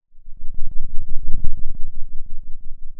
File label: Sound of a thunderstorm, center